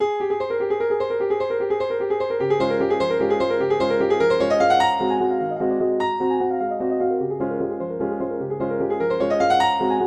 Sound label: Mic Pair; Minute Waltz